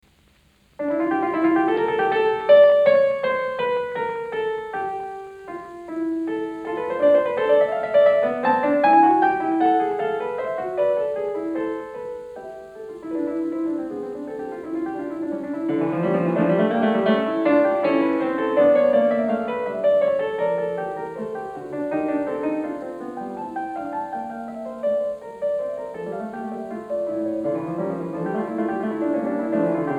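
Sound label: Fugue in D minor, piano; Bach; WTC, Book 2; Fugue No. 6; BWV 875; FISCHER, Edwin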